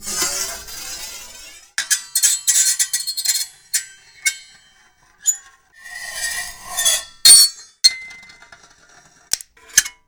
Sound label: Metal On Metal Sampler